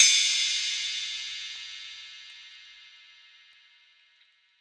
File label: ride; dough-samples; eddyflux